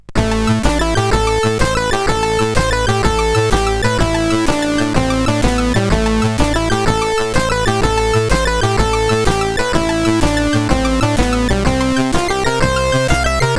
Crank, sid CSG8580R5; Lft; Crank Airwolf

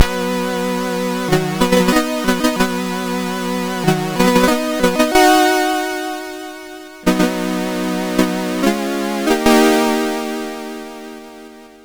Paddle Mania, CSG8580R5

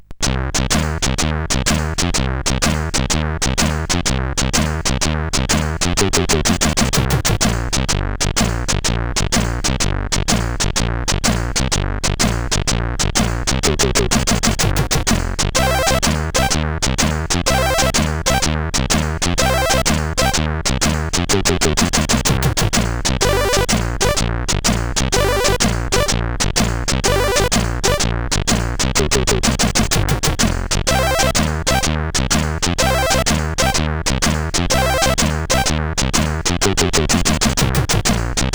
sid, CSG8580R5; 1st Chaff

